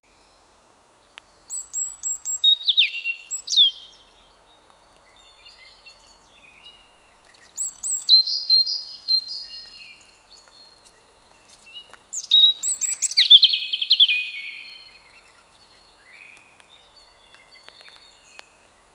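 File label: birds chirping in a forest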